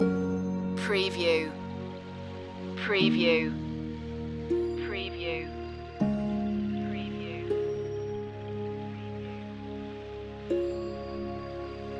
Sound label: gentle stream loop